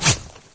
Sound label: whizz; weapons; source engine; dod